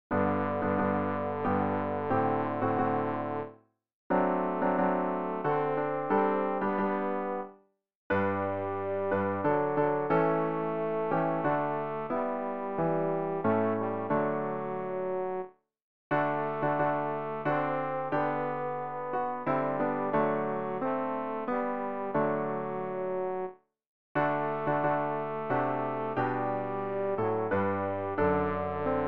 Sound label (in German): tenor; Ref. Gesangbuch; RG 519: Tritts im Morgenrot daher